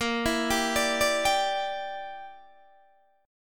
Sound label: Bb 6th Flat 5th Bb, Guitar DADGAD